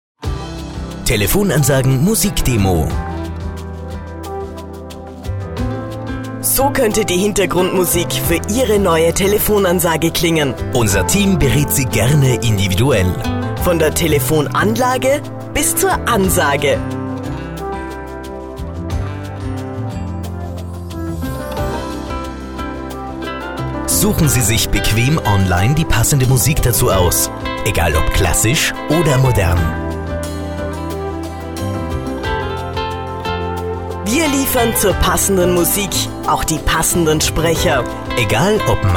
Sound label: Slow